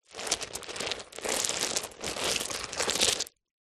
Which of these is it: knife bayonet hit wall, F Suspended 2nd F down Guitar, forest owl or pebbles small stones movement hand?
pebbles small stones movement hand